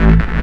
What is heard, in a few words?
hardcore, BASS, Techno Trance